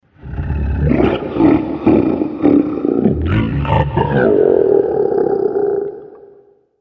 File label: Massive Dragon, monster; Monster Sound Effects; horror sound effects